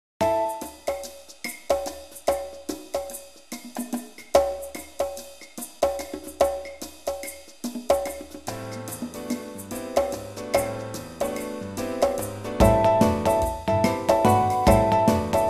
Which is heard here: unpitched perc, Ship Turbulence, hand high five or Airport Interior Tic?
unpitched perc